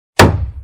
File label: spatial simple hammer